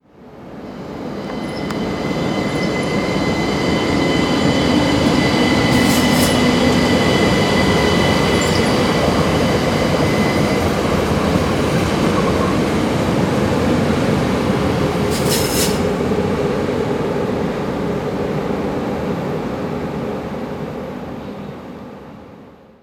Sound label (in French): trains diesel et electriques; sonotheque